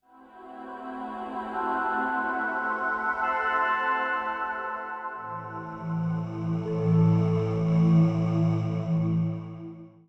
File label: SPACE PAD 140 A; SpaceSounds